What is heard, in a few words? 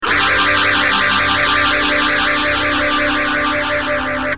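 guitar tc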